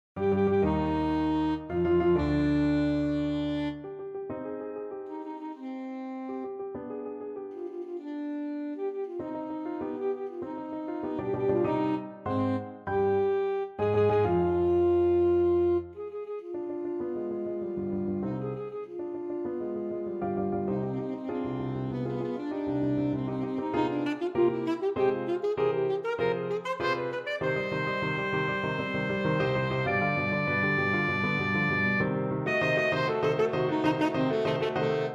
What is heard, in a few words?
ASAX, saxophone